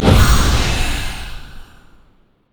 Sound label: morgana q dark binding; LoL